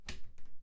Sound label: Cabinet Close; Phasmophobia